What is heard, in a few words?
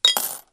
coins; Detectiveland